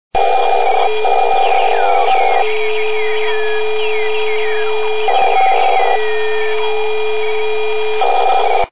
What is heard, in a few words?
whistler